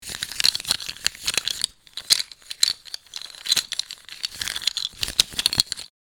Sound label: Plastic toy handling objects movements; gfxsounds